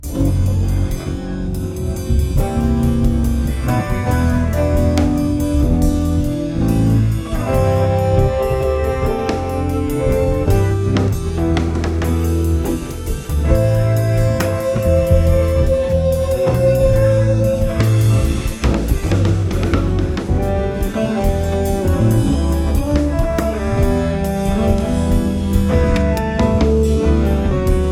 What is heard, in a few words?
electric bass with drums; Jesus playing electric bass at the sermon on the mount with drums